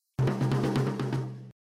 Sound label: tam